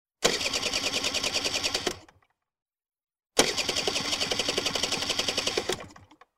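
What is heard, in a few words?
moped ne zavoditsia, moped won't start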